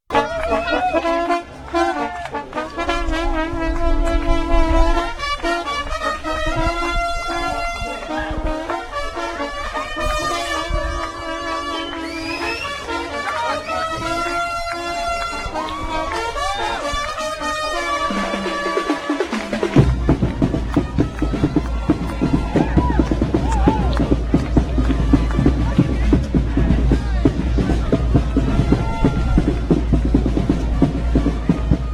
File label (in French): fanfare